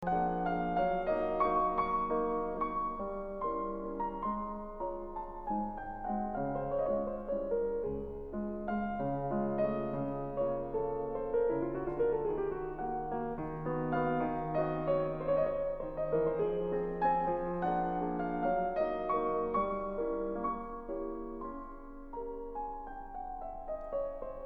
Waltzes Op. 64 No. 2 C Minor

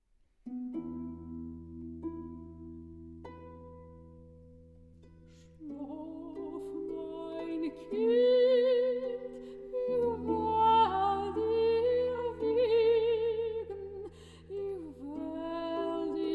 Sleep My Child; Szluf majn kind

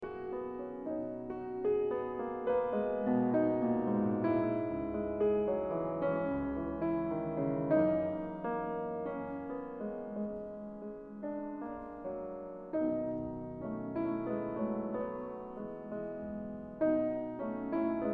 Sonata op. 58 B minor Scherzo. Molto

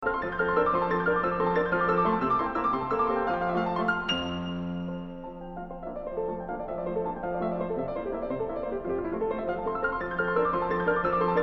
Waltzes Op. 34 No. 3 F Major

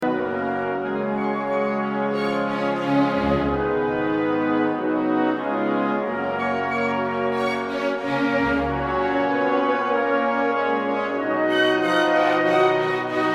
Piano Concerto In A Minor Allegro Molto

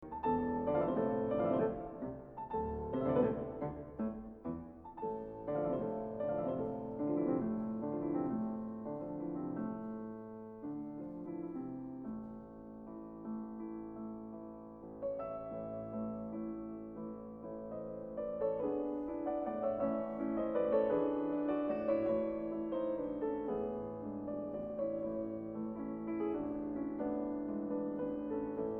Polonaise op. 44 F Sharp Minor

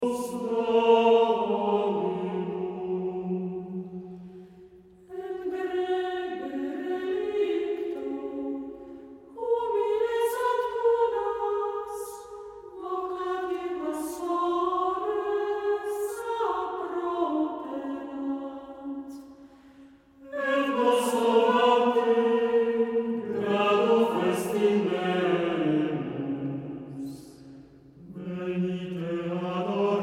Adeste fideles

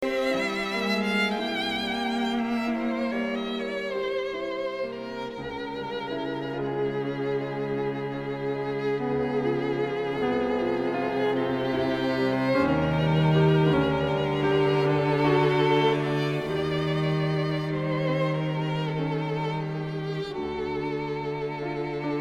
Piano Trio Adagio Sostenuto

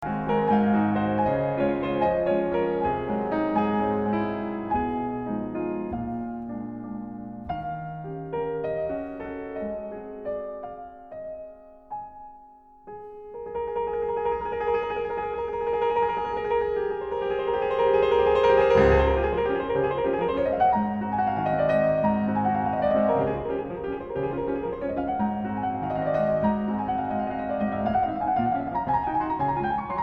Waltz in Db Major

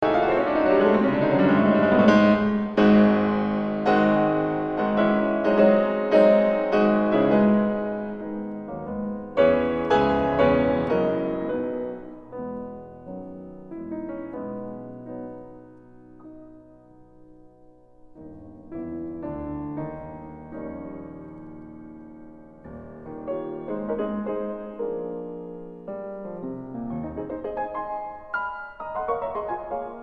Scherzo In E Major Op. 54

